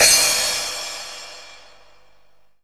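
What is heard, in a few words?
cymbal, dance drums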